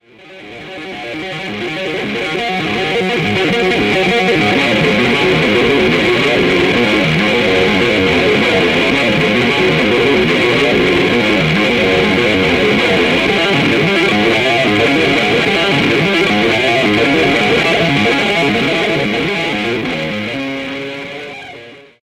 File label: impossible fretboard tapping; impossible sounding “fret-board tapping” type runs; Zystrix